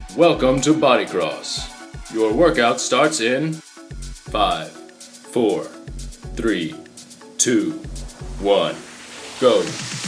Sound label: Dancing Synth Vox